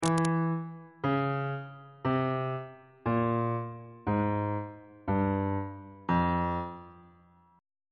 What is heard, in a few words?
f harmonic minor scale descending on bass clef